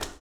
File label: STOMPS